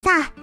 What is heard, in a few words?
jump; Iroha